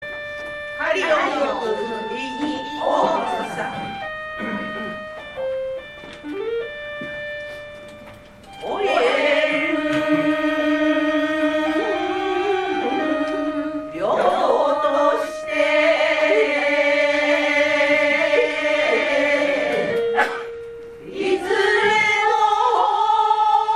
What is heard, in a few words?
gogin lady; kari wo kiku